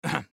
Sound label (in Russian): zvuki pershenie v gorle kkhm